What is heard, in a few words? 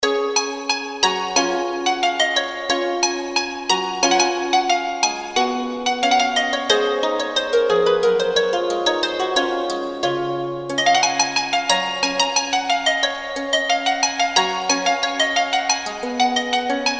bray harp; dowland puffe